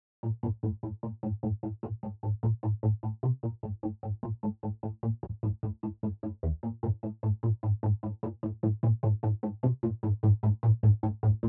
Bass

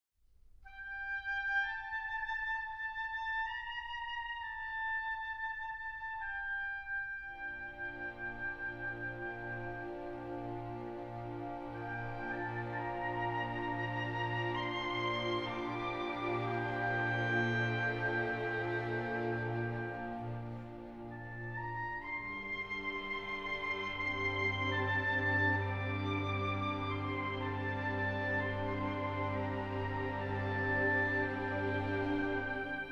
No Vocals; Assassin's Creed Syndicate

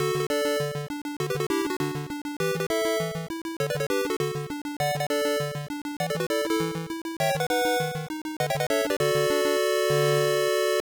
Game Clear; markham arcade

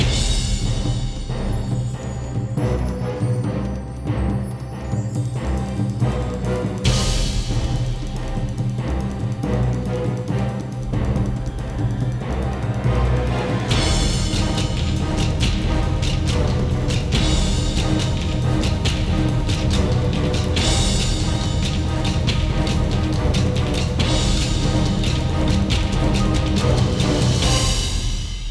Combat Marching

